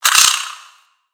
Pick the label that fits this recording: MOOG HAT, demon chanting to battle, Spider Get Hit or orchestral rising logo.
Spider Get Hit